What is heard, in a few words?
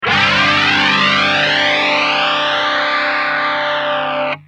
gtr mxr fd